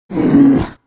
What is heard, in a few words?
Grizzly Vocal; Animals; XBOX; BassProShopsTrophyHunter2007